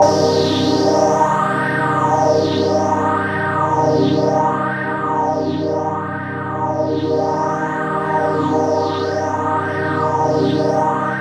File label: C3, Synth Line; 90 s Sample CDs; NiceBeats; SynthLine VOL-11